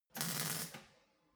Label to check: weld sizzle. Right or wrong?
Right